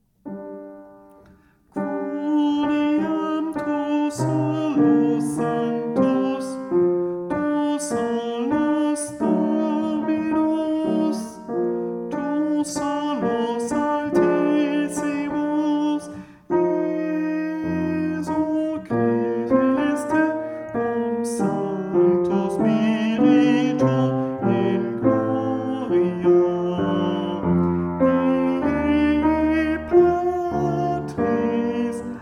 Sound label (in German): Tenor